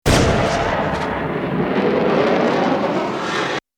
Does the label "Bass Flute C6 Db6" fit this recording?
No